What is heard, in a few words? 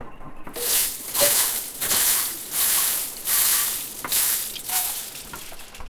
washing vegetables in bas, basket